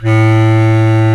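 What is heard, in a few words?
bass clarinet, Orchestral Winds; Roland LCDP04 Orchestral Winds; Lo Clarinets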